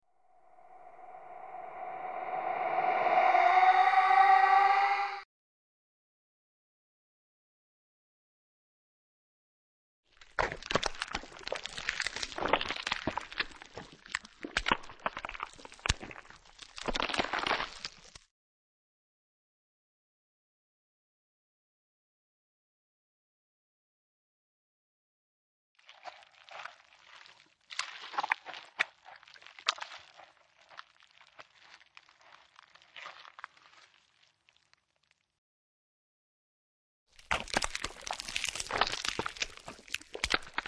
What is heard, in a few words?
dark darkness